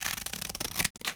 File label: Cutting Paper with Scissors; Office Supplies; 1001 Sound Effects